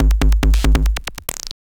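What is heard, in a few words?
DRUMLOOP ELECTRO 140; Techno2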